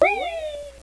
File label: wheeeeee